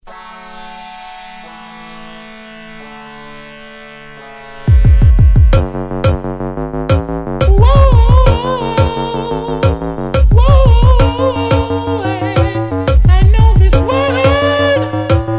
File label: REGGAE